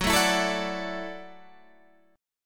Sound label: F sharp Minor 7th Sharp 5th, Guitar Open G; chordshape; down